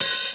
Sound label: Hats and cyms, Funky Drums, Kit hits; Neil Conte's Funky Drums From Hell (1992)